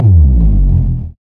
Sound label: Drop; osu